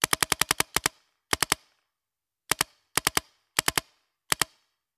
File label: Paintball Gun; Sound Ideas; Guns